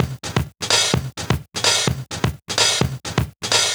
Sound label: House Loop, 128 BPM; Vengeance Samples